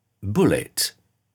bullet

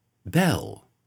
bell

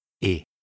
ship sound

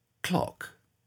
clock

